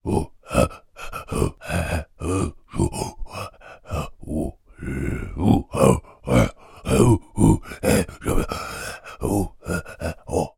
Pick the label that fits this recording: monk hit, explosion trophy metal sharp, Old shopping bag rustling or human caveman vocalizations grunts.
human caveman vocalizations grunts